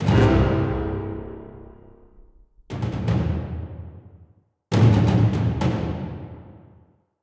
win traitor prep

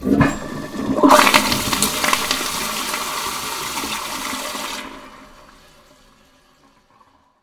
toilet flush

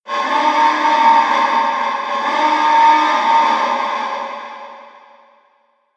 objective warning small

